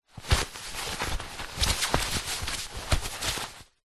foley fabric thick fast heavy movement; zapsplat

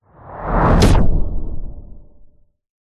cinematic whoosh into dull hit; sound design

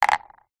container small plastic empty down on wood

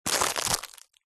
foley packet plastic cat ball bell pick up; zapsplat

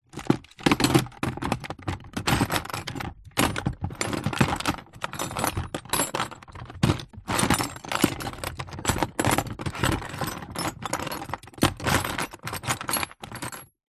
industrial tool box plastic rummage

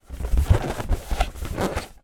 foley balloon inflated handling

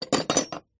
industrial metal hacksaw junior down on surface

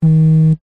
household mobile phone vibrate held in hand; zapsplat

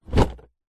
board game box open; telstrations

